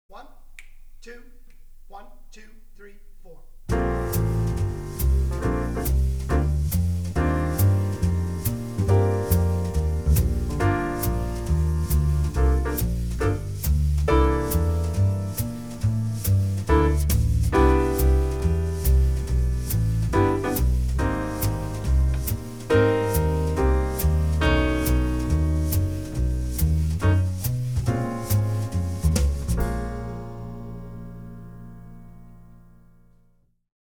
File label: consonant and dissonant